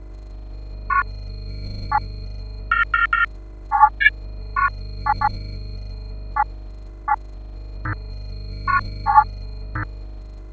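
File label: computer hum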